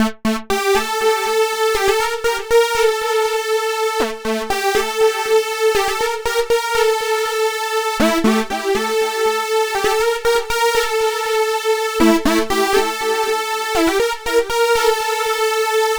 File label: Euro Synth; Sonic Foundry (Sony Creative Software); Club Joints and Street Anthems; Ruff Side